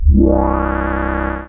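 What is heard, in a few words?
Door; sci-fi FX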